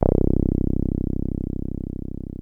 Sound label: 303 C1, TB 303; Hollywood Edge; Giorgio Moroder Rare Synthesizer Collection